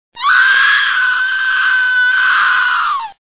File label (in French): Cri